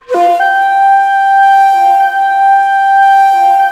Shakuhachi With Echo

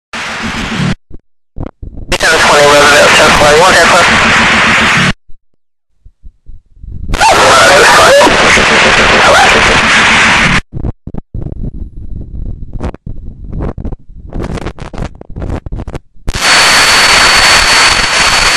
Police Scanner Static

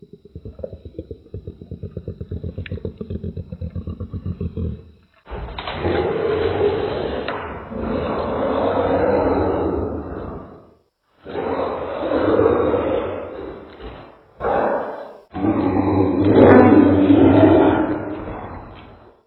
Distant Monsters Fight

